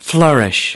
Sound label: flourish; 'flʌr.ɪʃ